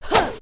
女激励; renlei

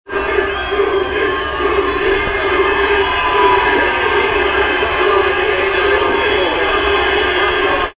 愉快

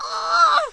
女受伤声音嫩

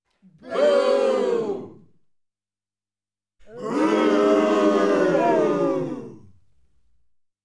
众人(成人)做嘘声(短)

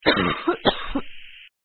女生 咳嗽; renlei

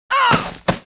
女死亡、倒地

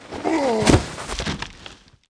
壮男 死亡 倒地

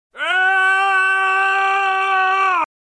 喧叫

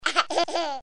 婴儿笑

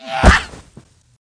女怪激励; renlei

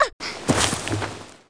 女死亡倒地

